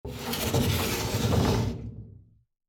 襖扉を開ける音; door

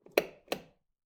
和風 電気を点ける音; light

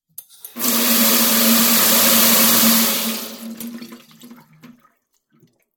蛇口から勢いよく水が出る音

